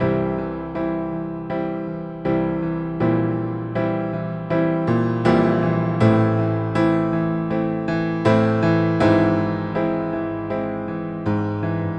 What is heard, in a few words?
70s Ballad Piano